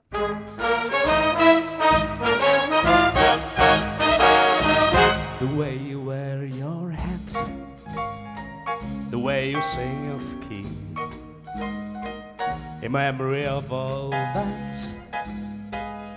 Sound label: big band